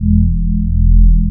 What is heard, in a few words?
jumpstart pass effect; Earth and Beyond; EA Games